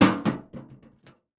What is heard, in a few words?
foley metal box old rusty set down; zapsplat